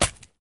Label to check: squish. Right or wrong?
Right